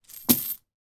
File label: foley cloth money pouch with coins in drop on carpeted floor